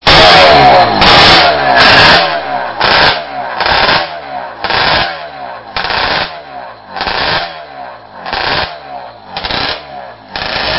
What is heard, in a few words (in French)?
bruit moteur; bikers classics